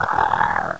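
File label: die, alien controller; baby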